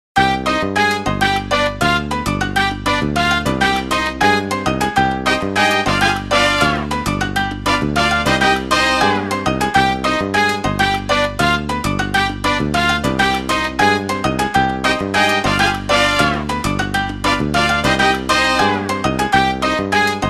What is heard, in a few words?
Ring Ballroom Caliente, Windows Mobile ringtones; Individual download-only (2008)